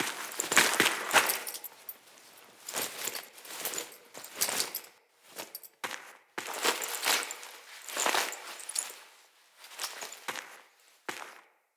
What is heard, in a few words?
Spell Foley; Envoy; Cinematics; Bromius; Bromius Quest Verminia Spell